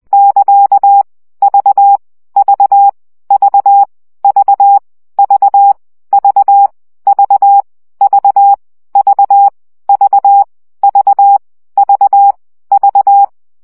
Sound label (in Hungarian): CW gyakorlas v 7 baud